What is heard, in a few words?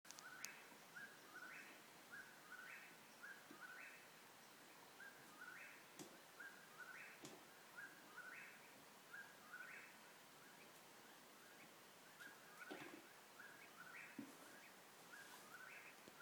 whipoorwill